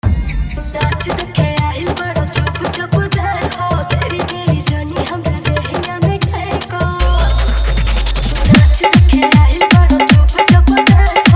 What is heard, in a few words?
Fully Dhollki Bass